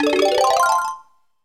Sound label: Turtle Jet Get, Sound effect